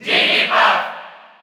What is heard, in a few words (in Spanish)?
Cheer Spanish; Jigglypuff; SSBU